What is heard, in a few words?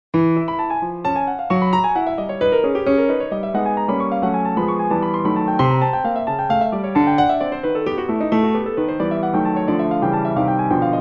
piano, klingeltöne; karl frers